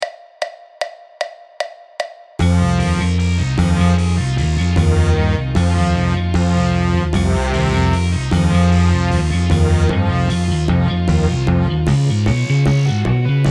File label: snare solo slow 76 bpm